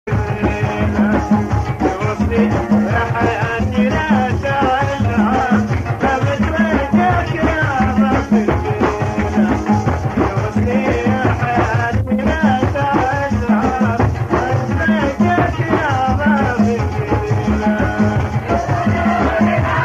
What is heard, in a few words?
Oud